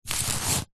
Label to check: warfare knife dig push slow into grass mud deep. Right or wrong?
Right